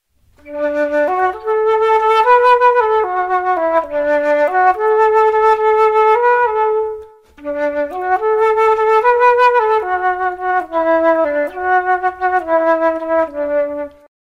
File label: flute